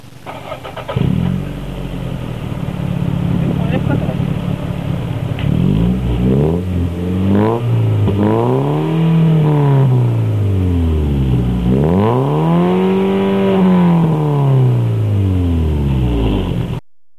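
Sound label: hyper meda, muffler, TOYOTA CURREN; タナベ ハイパーメダリオン; TANABE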